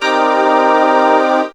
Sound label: synth c, Techno Chords; Best Service ProSamples vol.37 - Dance Synths